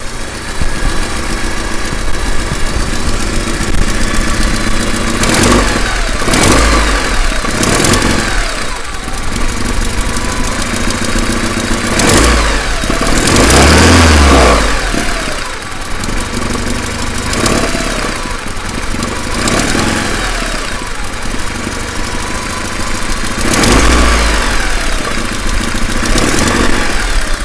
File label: Noisy lifter sound